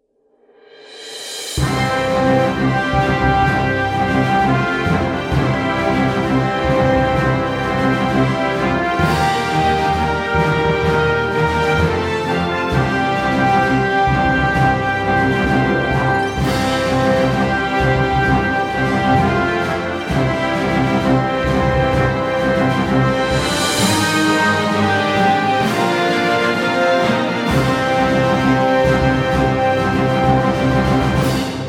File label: Won Music; Majesty 2